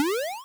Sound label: jump; martian mike